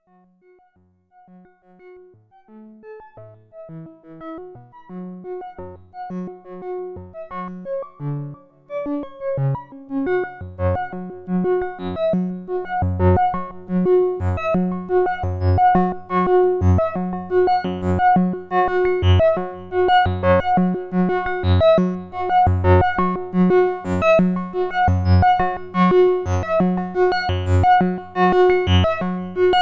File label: A109 simple sequ